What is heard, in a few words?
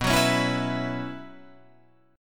B Suspended 2nd Suspended 4th B Guitar Open F, chord; Bsus2sus4 strummed; 11,13,13,11,14,11; JGuitar